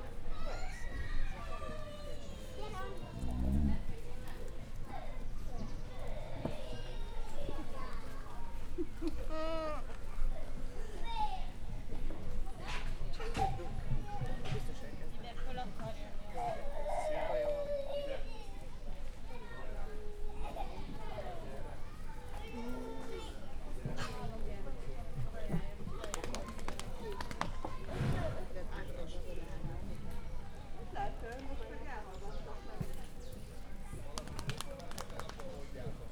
látogatók, parasztudvar; Győri Zoo 2014

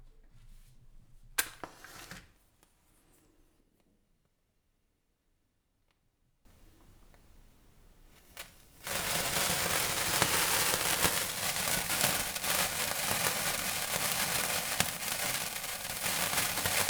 meggyujtas, csillagszoro; Perkupa

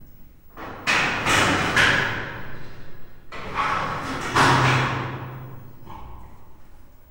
rácsozat kinyitása; miskolci zoo 2009

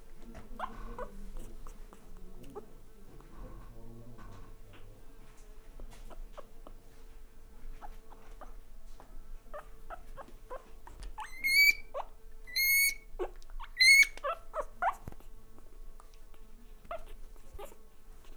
tengerimalac; parasztudvar

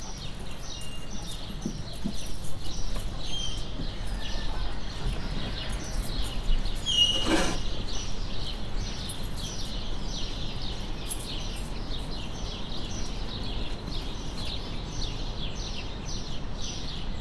füttyszerű hangot hallat, közönséges tapír; debreceni zoo 2012

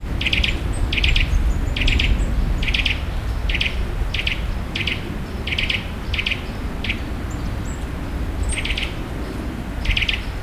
másik hangja, csuszka, Visegrádi-hegység; termeszetben

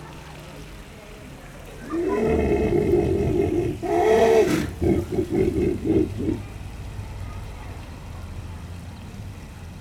hangosan megszólal, nílusi víziló; debreceni zoo 2012

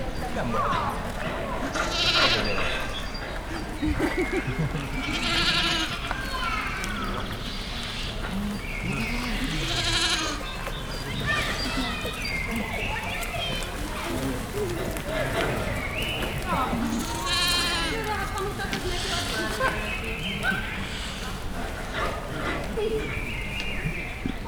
mekegő állatok, házi kecske; felsolajos zoo 2015; cardioid

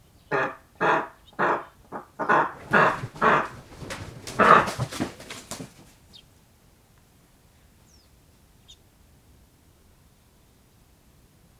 egymagában, parlagi sas; allathangok; termeszetben; gores tanya

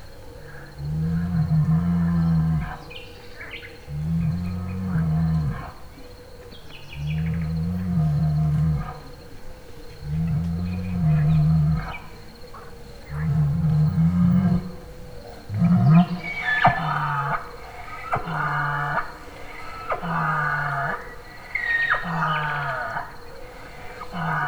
magas hangot is ad, őstulok; Hortobágyi Nemzeti Park

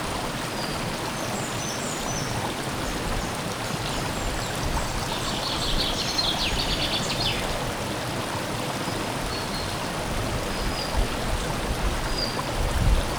balrol szello, madar, patak; visegrad

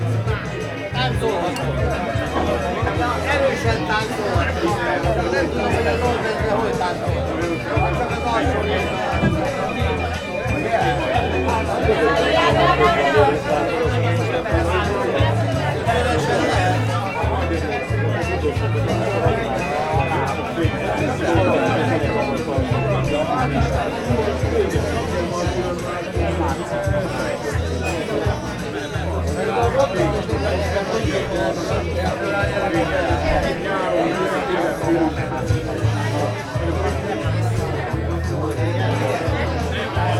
babeli zurzavar, hatterzene, romkocsma; szimplakert

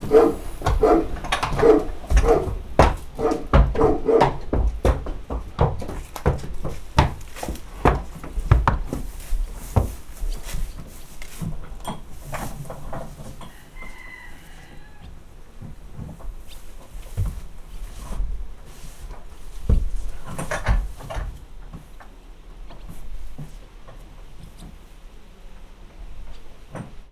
istállóba bevezetik kutya szél, ló; állathangok; paraszt udvar

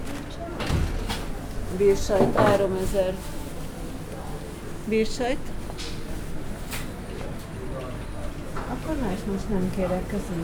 magos, lehel piac

